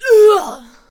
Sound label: Harvester Death Vocals